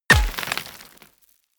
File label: bullet large impact dirt